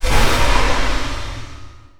dispel magic; weapons; dota; brewmaster

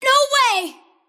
deny pain; kidvoker; dota; invoker

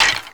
skulls, footsteps; witchdoctor

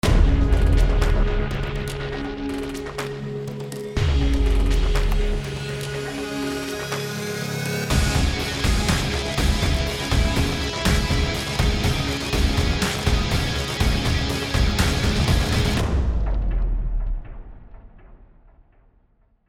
lose; radiant

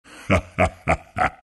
laugh; dota; earthshaker

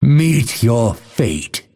cast pain; dota; phantom assassin

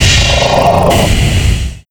satyr hellcaster cast; weapons; dota; creep; neutral

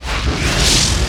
bladefury start loop; weapons; dota; juggernaut

